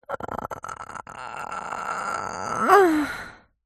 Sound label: human female groan pleasure orgasmic; zapsplat